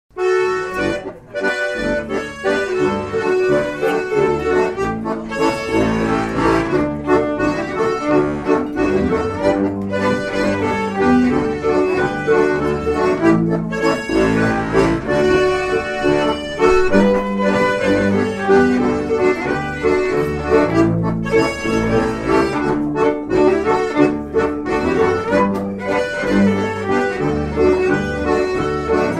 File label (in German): Triefache; Giersberg Sep2010